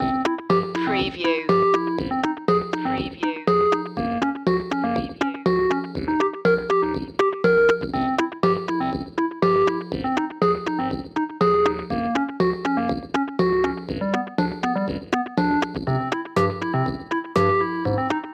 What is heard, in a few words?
8 bit boss underscore